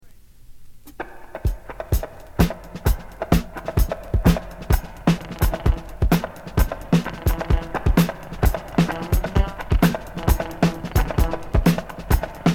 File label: electro harmonix work band state of the art electronic devices i am not a synthesizer